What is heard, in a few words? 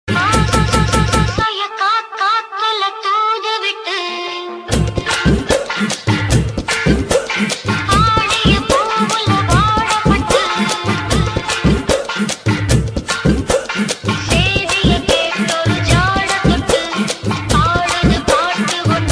Top Tamil Oldies Mix